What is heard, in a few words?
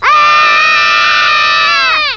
Ahhhhh